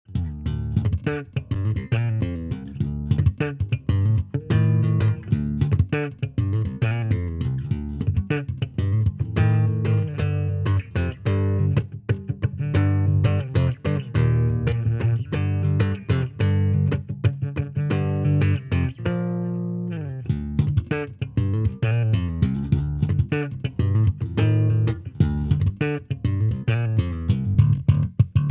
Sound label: Flying V HiLoBoost Slap